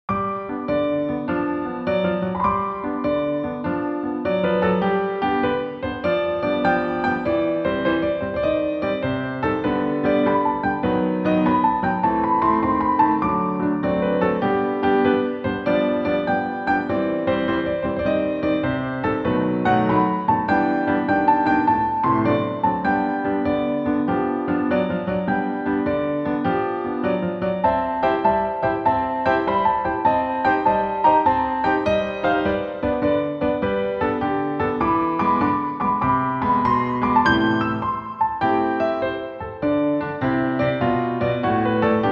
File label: monkey natural movement